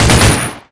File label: mgun; poke646; hgrunt